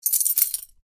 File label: Keys jingling loosely slight ring